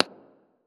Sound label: Echoing Pop